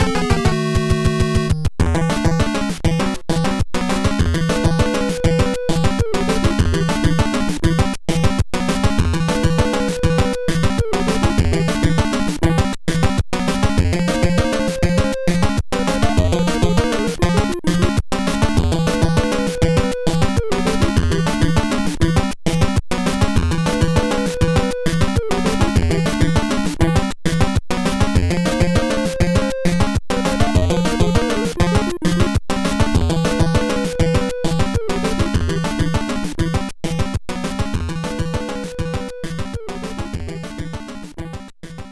Boss, Hurry Up; super mario maker 2; SMB3 Boss

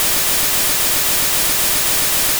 Solar Beam; sfx; attack moves; pokemon; gen 1; rby

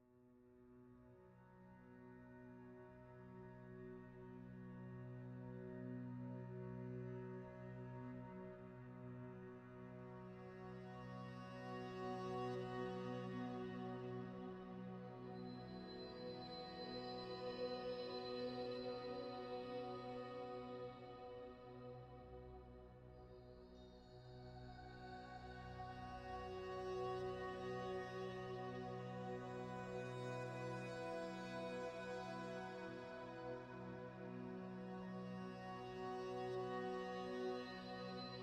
tonal Amb Full B

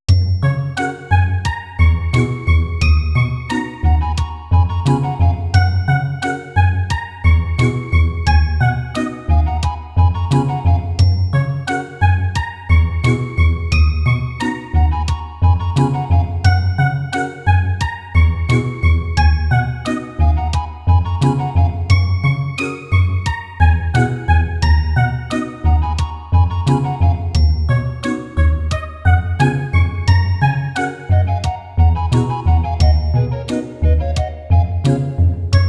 Song Of Starflight ~metronome~; Planetarian

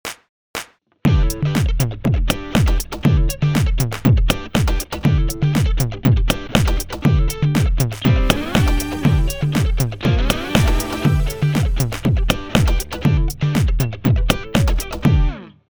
Robot Dance; Phineas and Ferb: Day of Doofenshmirtz; playstation vita

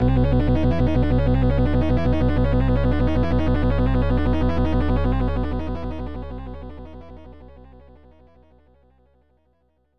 Level Music; Bioworm; SNES

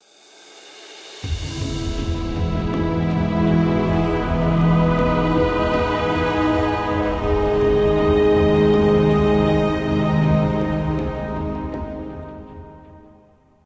UI Sound UI BGM Area Text Rise

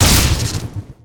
Weapon Fireball Damage

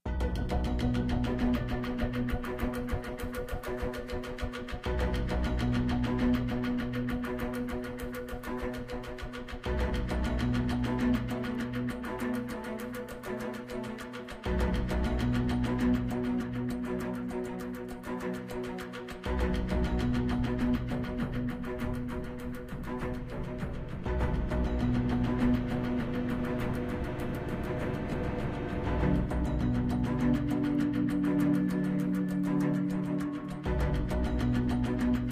Island Pulse Bed 100bpm Fullmix; Battleship; Xbox 360

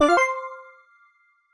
Jingle Arrival Master

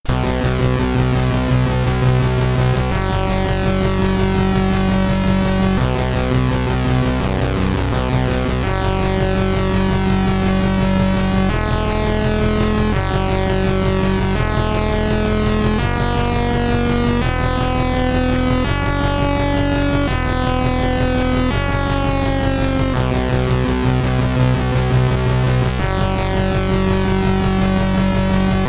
Boss 7 (SID Stereo), Commodore 64; Gemini Wing

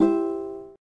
C Major Ukulele; Notes; Scratch

